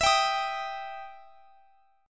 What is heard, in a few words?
E Minor Major 7th E down; EmM7; strummed